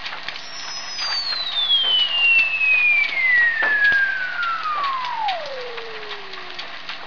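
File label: Weddell seal underwater T calls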